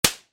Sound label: spank; The Witcher Hunt